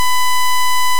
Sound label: sawtooth 1000